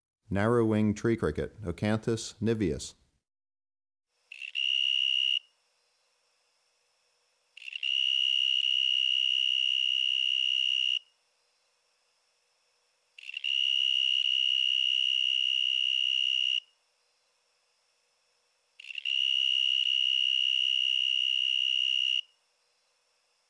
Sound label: Narrowwinged Tree Cricket, Oecanthus niveus; Crickets and Katydids